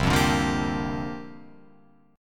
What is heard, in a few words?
C 9th Suspended 4th, Guitar Open F, chord; C9sus4 strummed; JGuitar